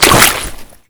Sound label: jump water; STALKER; IWP